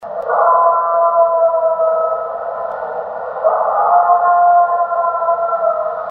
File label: COYOTE MOAN